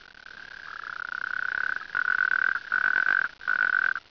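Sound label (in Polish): bufo calamita, plazy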